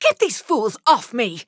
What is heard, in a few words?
Taking Damage